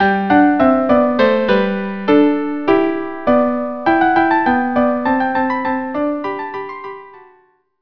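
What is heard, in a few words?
G Rigaudon violin viola duo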